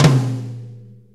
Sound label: 4-4 Tom Flam, Drum Loops